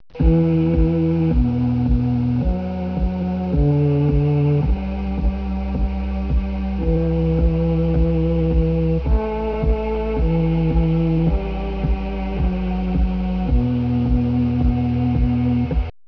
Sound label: zombie song